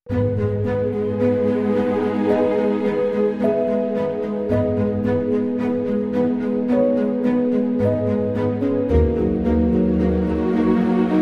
Dramatic piano band strings dark beauty; Bootflare

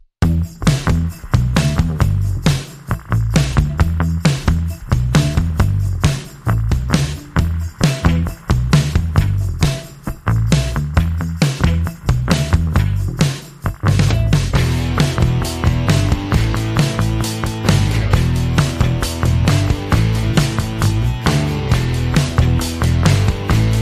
Indie rock commercial; Bootflare

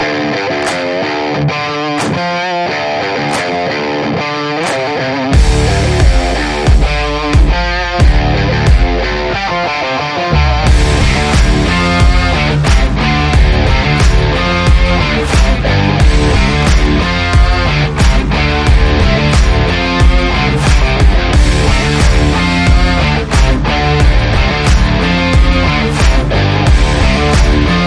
Commercial rock

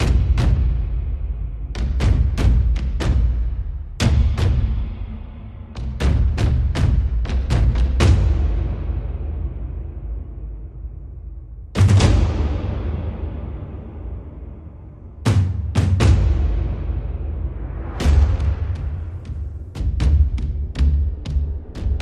Big epic drums; Bootflare

